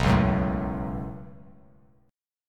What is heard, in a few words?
B 7th Suspended 2nd, Bass Standard; B7sus2; strummed; JGuitar